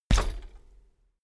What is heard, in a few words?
bicycle bike land rattle front